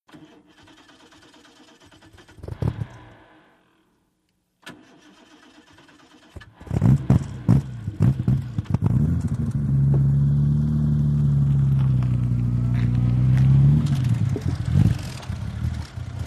VEHICLES ASTON MARTIN: EXT: Start, after two tries, reverse, switch off